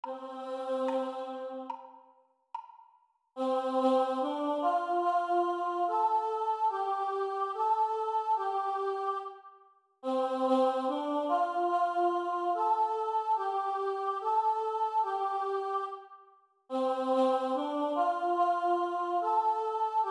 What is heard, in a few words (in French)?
Chant Vx Seule